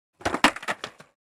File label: Skateboard stunt bailing designed